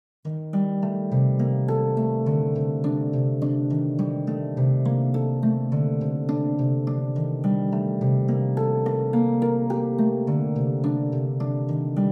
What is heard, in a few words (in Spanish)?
arpa